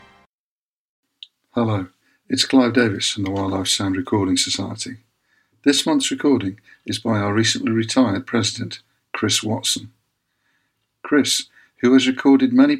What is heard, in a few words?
wildlife sounds recordings in my back garden